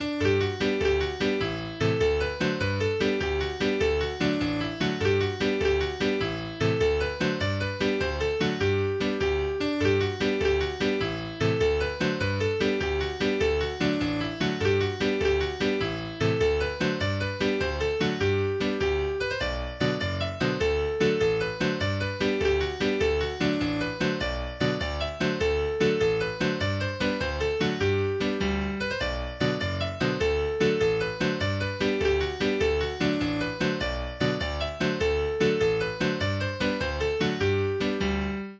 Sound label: Windsor Park, english dance tunes; eighty english dance tunes